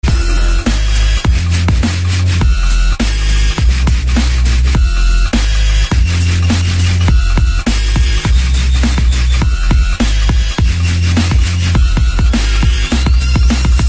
Night Dubstep Beat

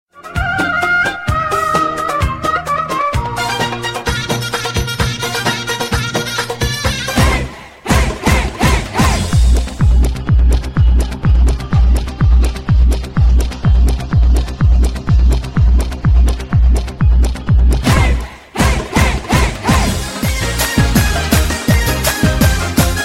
Oriental Music

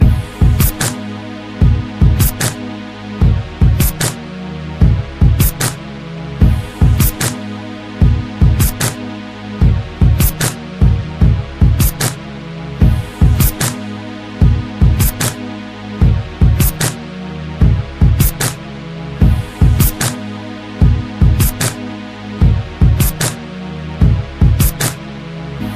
gangsta beat; hip hop

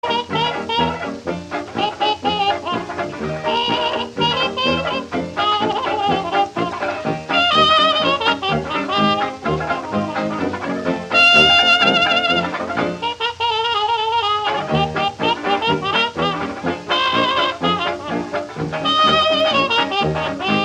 old jazz

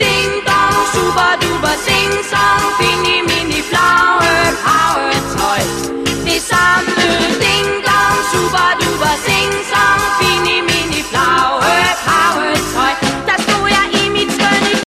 Ding Dong Danish

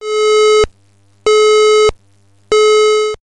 Evac Alert

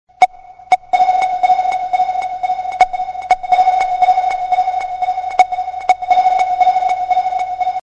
Bat Sonar

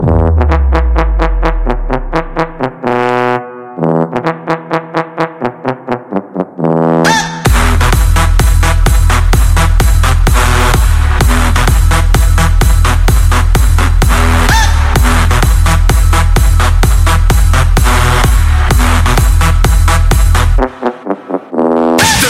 Trombone

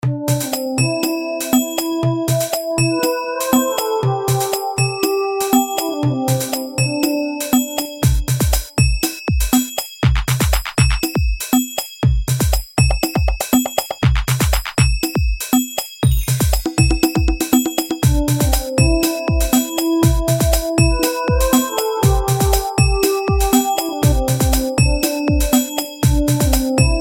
gabba bellz; ringtones

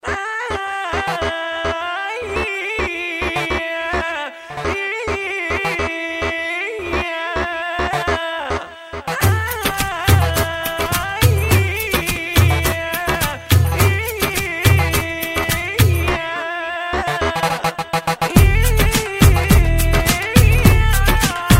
Oriental Beat

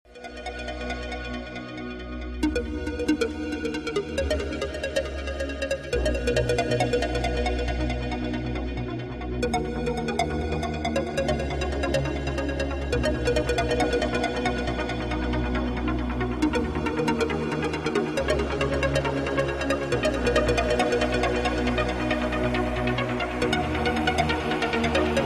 trance; electronic

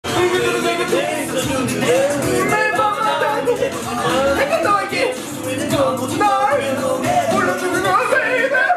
Fan Chant

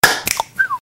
Notification

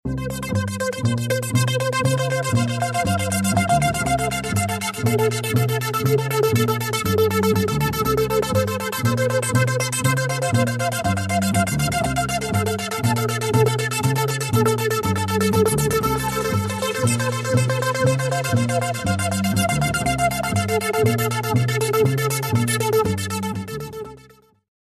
sleep

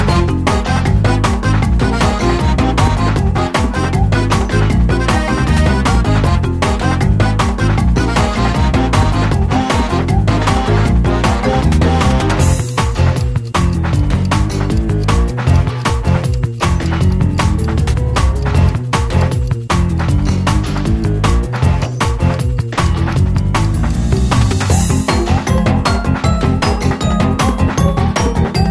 Electro

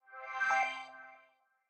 threat found

